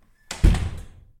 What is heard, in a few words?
door close; Golden Crayon Sounds